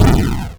8bit bomb explosion

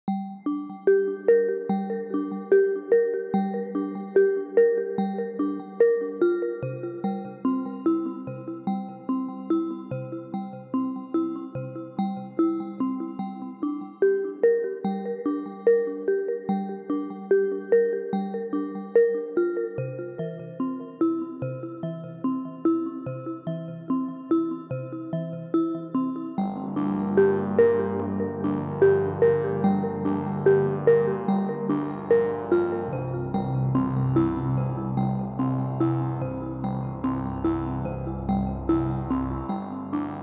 Ambient/loading screen game music; caller

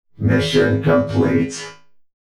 mission complete

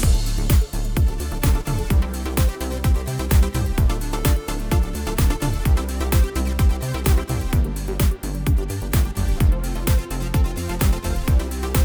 technotronic

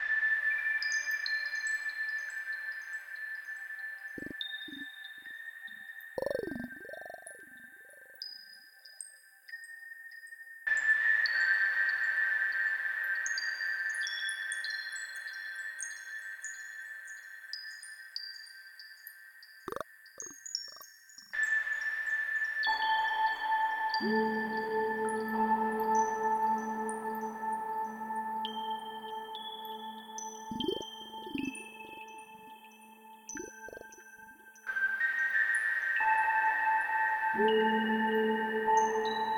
frog cave, Some dark ambience

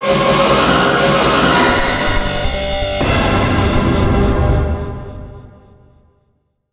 jingle

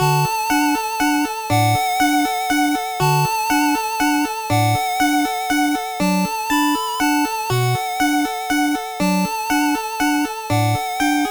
lullaby; Bitwise - An Eight Bit Video Game Soundtrack

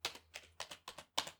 keyboard typing

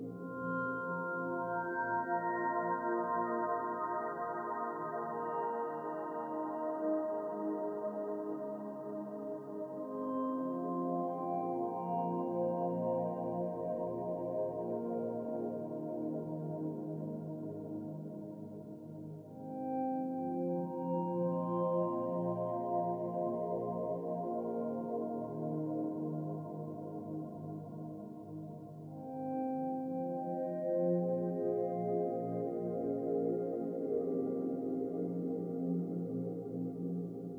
a friendly ghost minimal loop

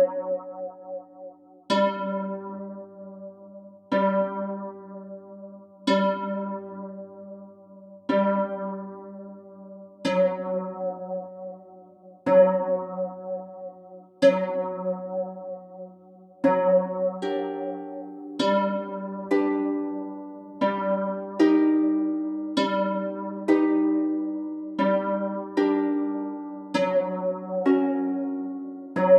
tension; 2019 Eclectic Music Pack

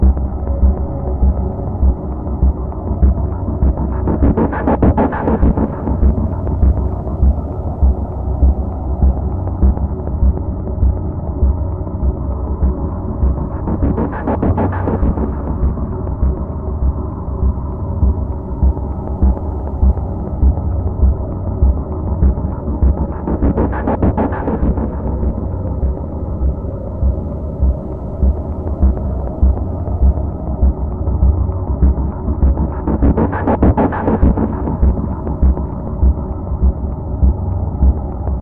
Dark Ambience Loop

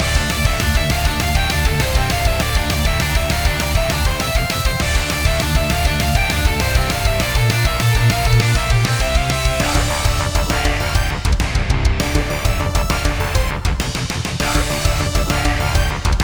Boss Battle Metal loop

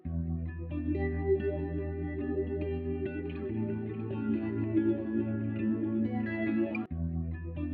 dreamy guitar loop; dreamy guitar licks

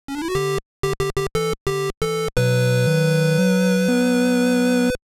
Victory!

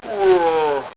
enemy death, bitcrushed voice one shots

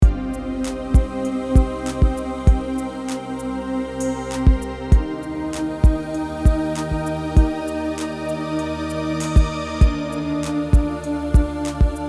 Enchanted Hollow

